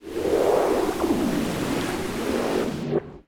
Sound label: designer brake